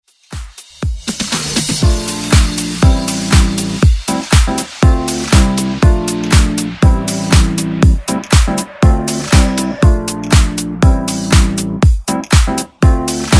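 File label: Dance Extended Redrum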